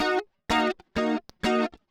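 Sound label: gtr, guitar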